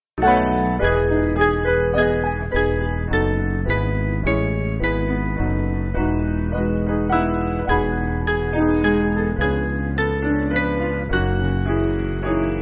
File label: Piano